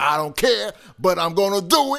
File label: Rap Vox